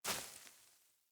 Sandals Land Vegetation, footsteps; Player

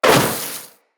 Fire Arrow Impact

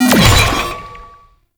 Moto Race Bumper Hit; Warframe; Motorcycle Racing

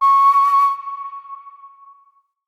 Default Flute Alt C Sharp; Player Instrument

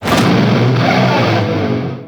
Subwoofers Cast 3D; Warframe; Temple

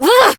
Exert Adult, Melee Swing; New War; Operator

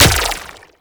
Grenade Bounce; Warframe; Lasrian Soldiers; Weapons; Lasrian Chem Nox

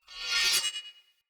Short Sword Whoosh Accent Heavy; Weapons; Player; Swords; Sollos

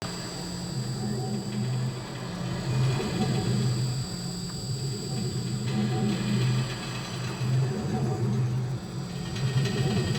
Bird Repulsor Loop; Objects; Thawtide

